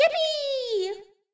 yippee; sm64coopdx; char-select-extra-chars; toadette

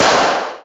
group step; HeavenStudioPlus; catchy Tune

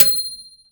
ding; Heaven Studio Plus; cannery

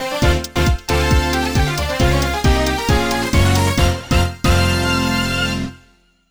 challenge win; Heaven Studio Plus

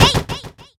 throw; Heaven Studio Plus; pajama Party

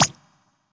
flip; HeavenStudioPlus; Flipper Flop

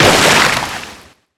peach cut; HeavenStudioPlus; lumbearjack

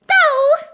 doh; daisy; sm64coopdx; char-select-extra-chars

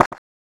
book vertical; HeavenStudioPlus; cheer Readers

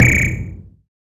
whistle; HeavenStudioPlus; cheer Readers

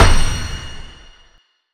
book boom; HeavenStudioPlus; cheer Readers

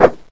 somen woosh; HeavenStudioPlus; rhythmSomen

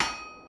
blaze hurt; mobs; Mineclonia; mobs mc

